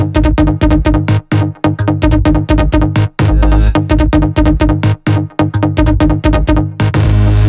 128BPM Brazil Melody; Vengeance Samples; Brazil 1 Kit

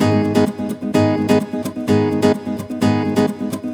Nylon Guitar - G# min, 128BPM; Vengeance Samples; Special Sounds; Nylon Guitar Kit

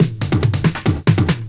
Loops Fillin140; Vengeance Samples; VEC1 Fills

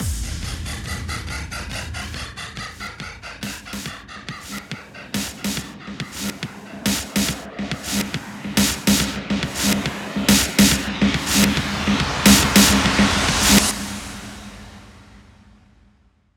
140BPM Reverse 8Bars, HandsUp & Trance Fills; ReverseTest; Vengeance Samples

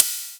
Ride, Cymbals; Vengeance Samples

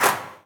Claps & Snares

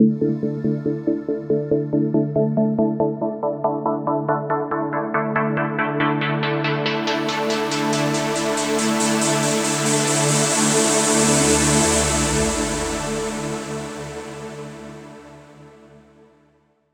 140BPM Juna D, Melody Fills; 8Bars; Vengeance Samples

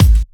Bassdrums

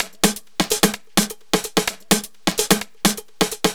Minimal Loops

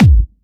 Bassdrums Clubhouse; Kicks; Vengeance Samples

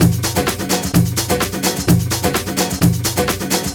Latin House Loop 128 BPM; Vengeance Samples

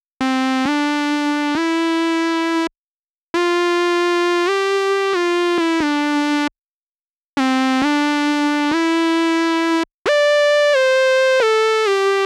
134BPM Mainlead Intro DRY, Cmajor; Vengeance Samples; Lovely Day Kit; Melody & Synth

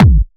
Bassdrums Clubby Kicks; Vengeance Samples

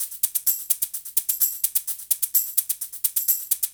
Percussive Loops 128BPM; Vengeance Samples

